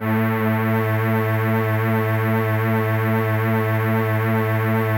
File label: SYN, ENSEMBLE; Best Service ProSamples vol.55 - Retro Sampler; AKAI